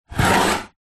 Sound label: spice jar glass small slide on wood shelf; food; zapsplat